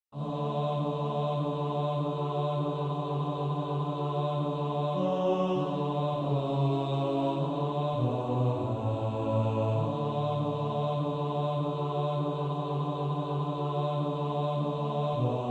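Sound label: bass; Praise him all ye little children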